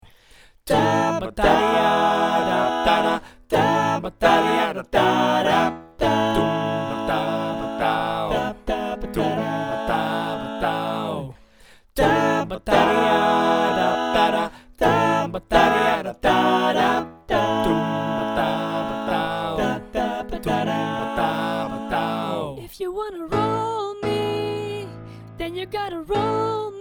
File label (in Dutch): tutti